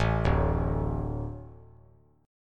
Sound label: D 5th D, down; D5 arpeggiated; JGuitar